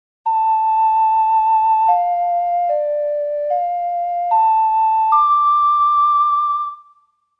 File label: Ocarina Commanding Vow; Majoras Mask Original Soundtrack